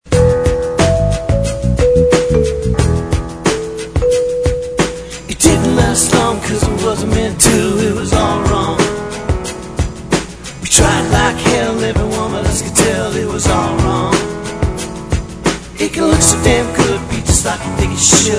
Hip Hop

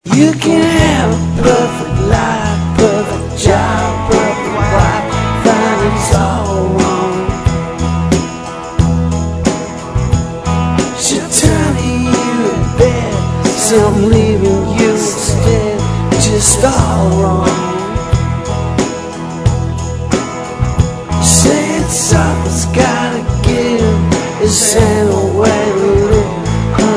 Rock Soul

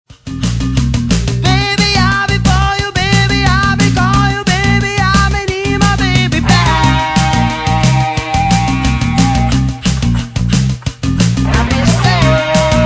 Baby Blues; Gigland Records

